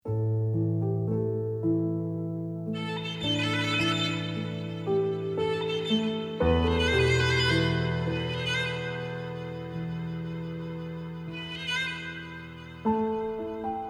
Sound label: violin trill